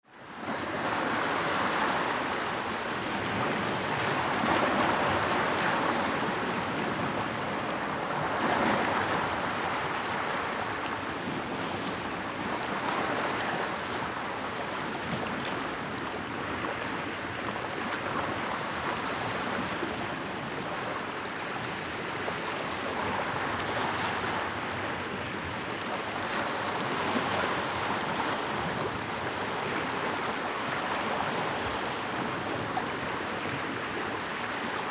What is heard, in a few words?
ocean beach